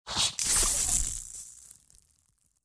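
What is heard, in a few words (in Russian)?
glitch с электрическим хвостом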